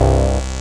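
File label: synth C, BUZZ SYNER, House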